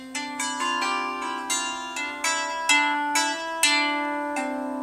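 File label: lyre music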